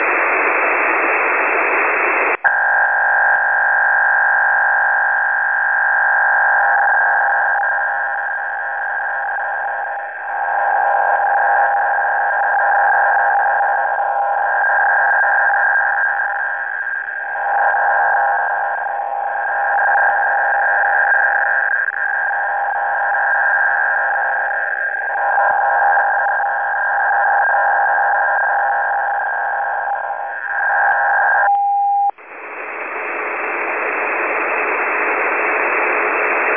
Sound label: BFSK 400-800